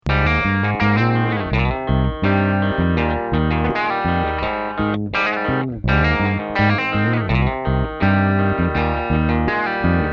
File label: instrumental